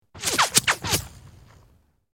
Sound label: Distant Multiple Bul, bullet bys